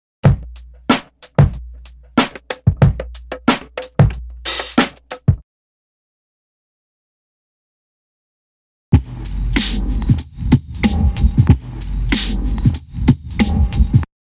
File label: Drum loop; BEST OF DRUM BREAKS 30